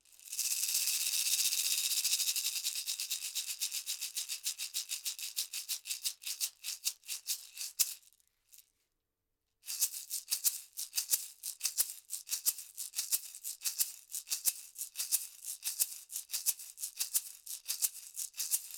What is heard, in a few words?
Shamanshaker Ocean